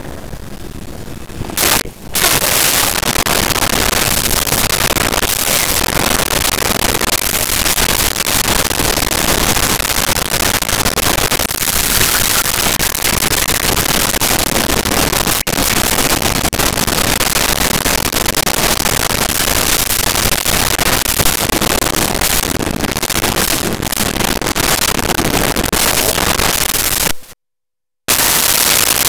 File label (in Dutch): stap; Home-made